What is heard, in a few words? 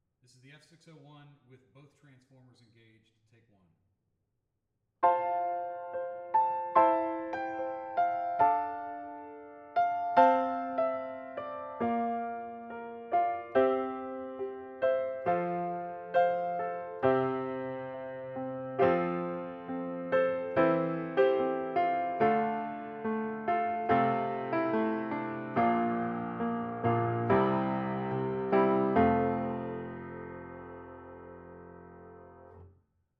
Piano; mic pre shootout